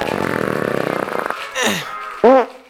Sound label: fart 1 med 1 short uh; Farts